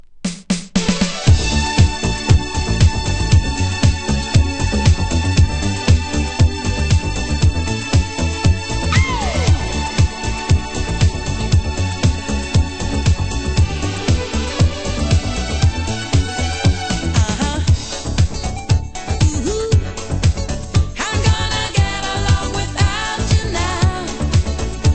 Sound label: viola